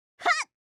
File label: FEMALE attack